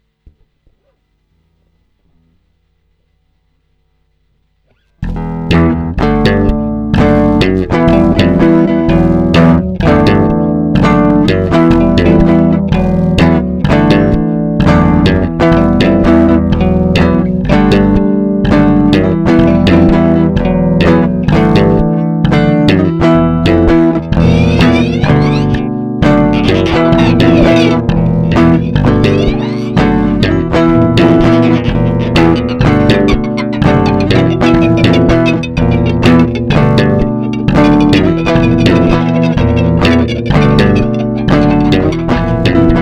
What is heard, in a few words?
Game Music